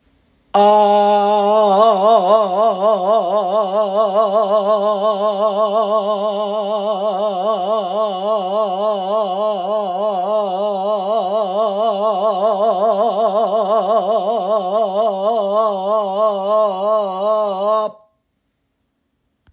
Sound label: vibrato that changes the size of the waves